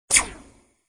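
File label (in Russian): screen went dark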